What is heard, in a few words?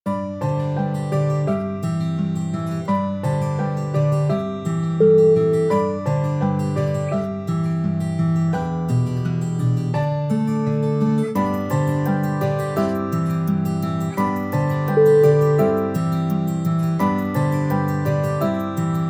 tender acoustic